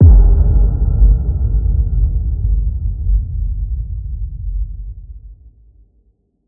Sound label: Sub Hit About To Break